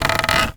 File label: Polystyrene Creak